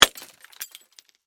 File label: Impact Glass Smash, Hard; Smash Sound Effects; Smashes (Sound Effects:Objects); BlastWave